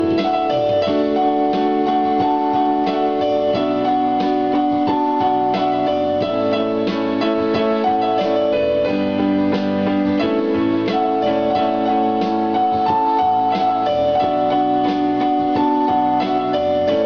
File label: Piano Cover